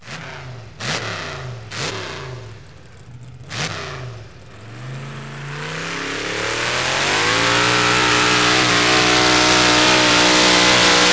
Tractor Pull; KABOOM!; Outdoors & Nature

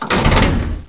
draw strt, doors; Hexen2

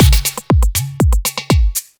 NiceUrdo(120), Beats

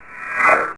Noise; PCGamer50

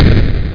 SPLIT; Monster Media Number 15

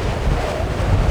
wing

